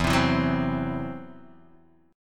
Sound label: E Suspended 2nd Sharp 5th, Guitar, Open D; 2,3,2,0,3,x